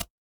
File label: ui click